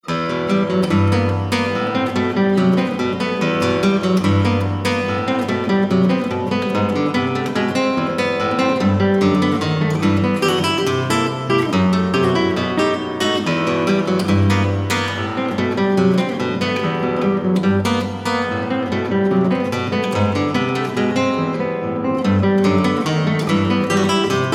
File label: tango